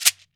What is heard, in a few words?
MARACA; AKAI S-Series CD-ROM Sound Library VOL-5; SHAKER.MARAC